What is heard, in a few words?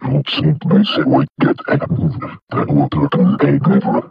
mburer idle; STALKER; customnpcs